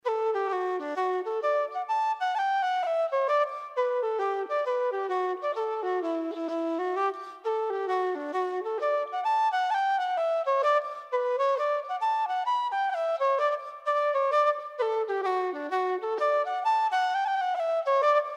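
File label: Liverpool Hornpipe, Traditional Irish Music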